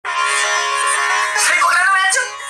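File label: happy shenai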